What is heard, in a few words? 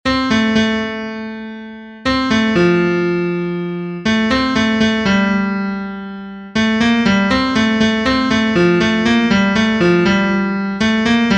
generated melody